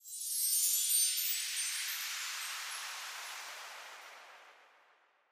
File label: Anticipation Start; dazzle